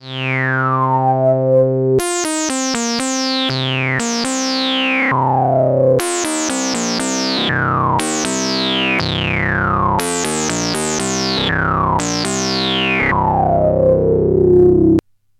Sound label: linFM